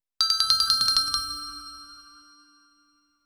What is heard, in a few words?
music adds xylophone